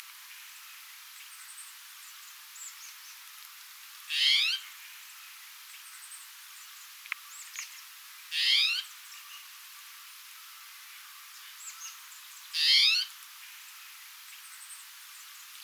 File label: Spotted Towhee Pipilo maculatus oregonus; sparrows